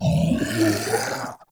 Flying Mouth Death; Warframe; ManInTheWall

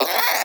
Cryptilex Vocal Tranquilized Grunt; Characters; Warframe; Hunting Critters

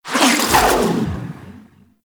Grapple Travel; Warframe; The New War; Archon; Nira; Abilities

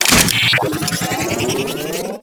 Arch Rocket Crossbow Reload Charge; Warframe; Archwing

